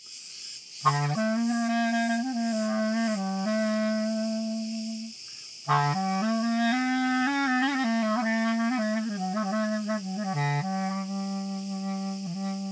Frei Hoch Tief Rau Ton Steigerung Spiel

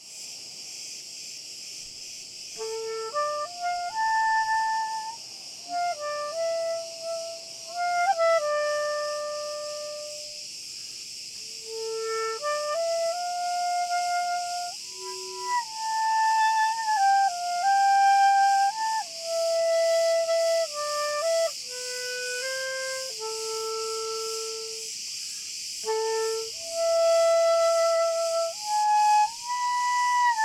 karidi Stand Hoch Spiel

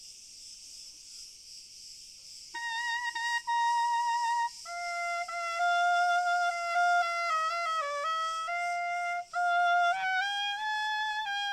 Grillen Chor Fern; Synonym O Ton Spiel

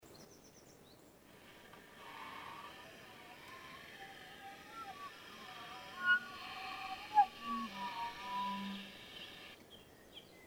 Luft Wind Vogel Dialog Multiph Echo Spiel; Minos Sud

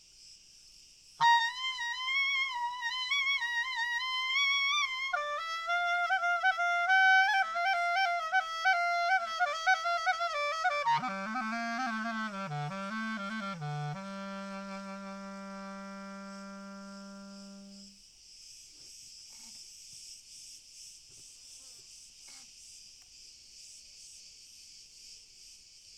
Synonym O Ton Spiel Grillen Chor Cl Fern

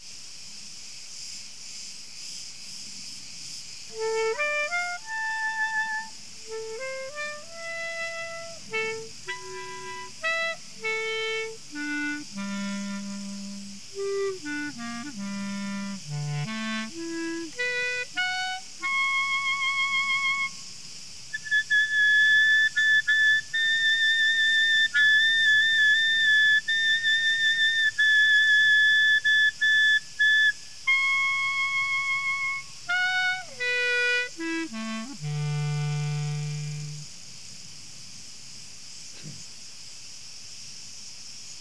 Grillen Stand Hoch Ton

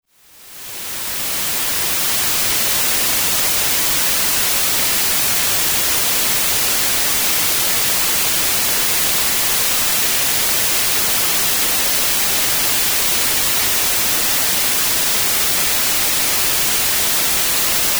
RAUSCHEN Weiss Rosa Braun

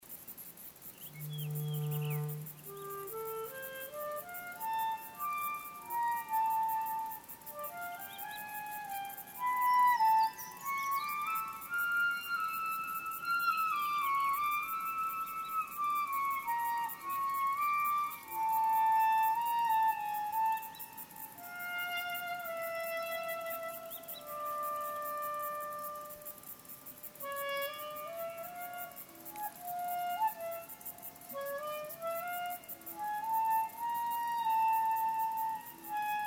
Melodic Echo Voegel; Minos Nord; Kriti Minos; Mino Stueck; kreta

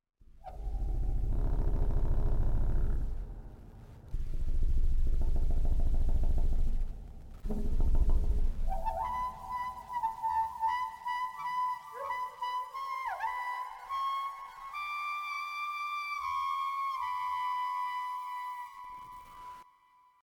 Rohr Solo, Reed; 7te Rohr HfK Solo

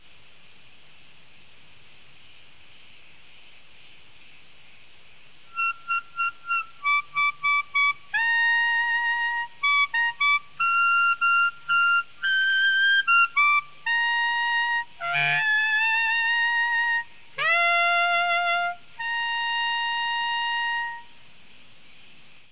Grillen Ober Ton Rein

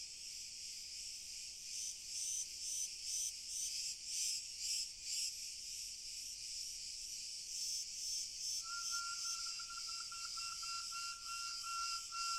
Frei Spiel Grillen

